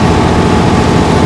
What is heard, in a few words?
truck; Mafie 1